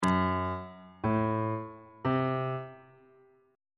g flat locrian chord 1 is g flat diminished on bass clef